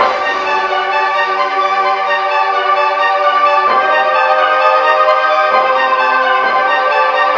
horns, Loops

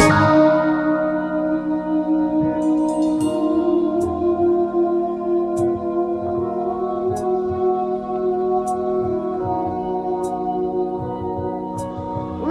keys, Loops, Sad

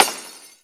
glass shatter short

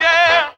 "yeah" SUNG

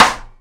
Snares, Rimshot

